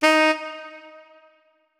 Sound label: notes, saxophone; Hideout Furniture